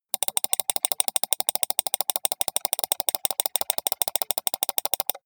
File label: computer mouse click sequence steady long